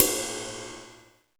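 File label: cymbal Jazz; hydrogen-0.9.3; GMkit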